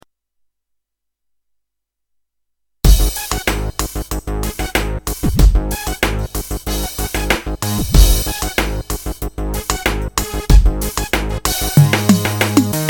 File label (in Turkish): GW-8 cepki